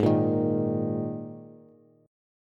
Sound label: Ab Suspended 2nd Bass; strummed; JGuitar